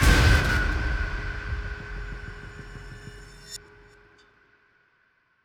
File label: shock instant; Joe's Diner